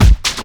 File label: KICK hardcore, Techno Trance DRUMS